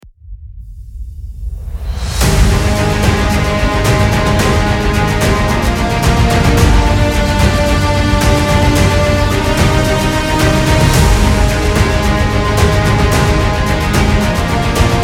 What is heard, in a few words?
Heroic mood